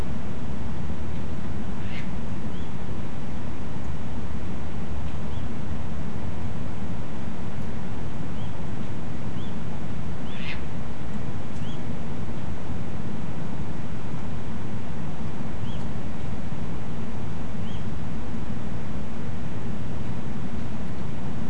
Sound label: Grey Heron plus series of calls waterbird, nocturnal flight call; nocturnal flight call project in beijing autumn 2021